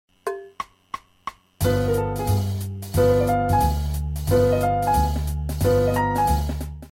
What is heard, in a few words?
4 note V, jazz piano